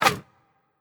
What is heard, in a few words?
Wood Slide